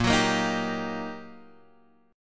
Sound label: G Minor Major 7th Sharp 5th, A sharp